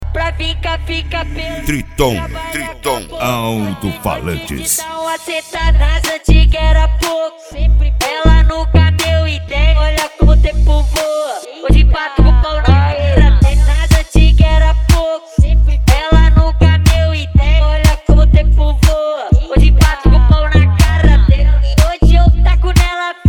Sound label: mega funk